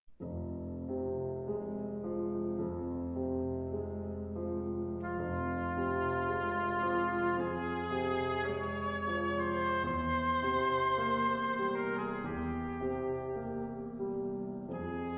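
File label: Andante per Oboe e Piano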